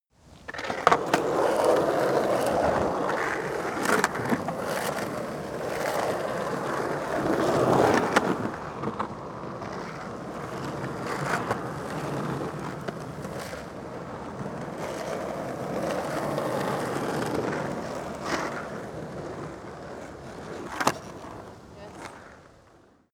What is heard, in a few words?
skateboard drive multiple sequence